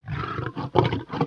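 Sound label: Pets Triceratops Happy; creatures; Lego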